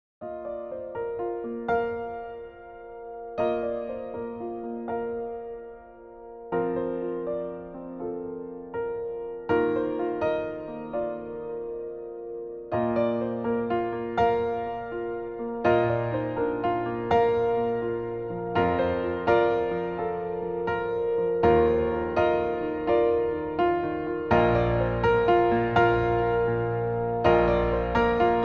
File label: Compressed Piano